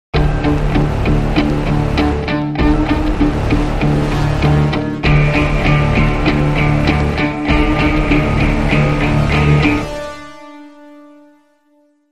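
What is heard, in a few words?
Crime Sting, No Percussion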